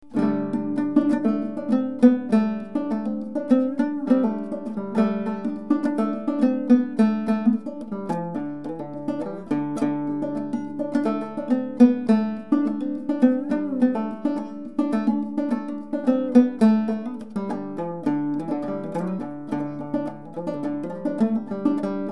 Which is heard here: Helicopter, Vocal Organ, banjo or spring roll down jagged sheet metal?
banjo